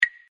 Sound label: select tile button click; mahjongg solitaire